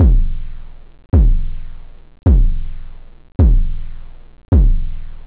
geblang, drums techno; OctaMED SoundStudio V1

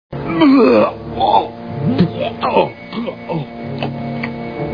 puke

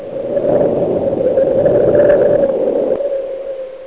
wind

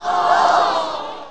Aww; Free Hearts; Gambling

